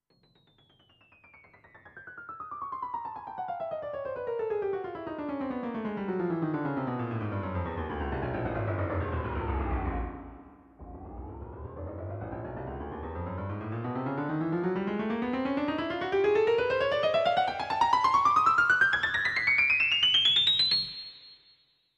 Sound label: Grand Piano